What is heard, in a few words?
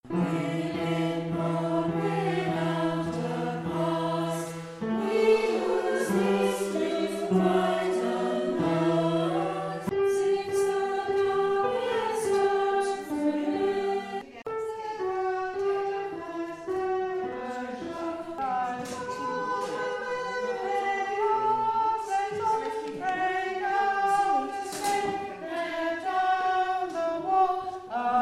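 Alto E minor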